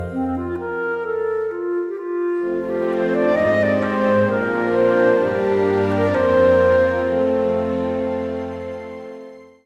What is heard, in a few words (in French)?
Serait ce une Clarinette; Les instruments de musique